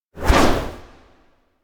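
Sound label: electronic body float up; anemoi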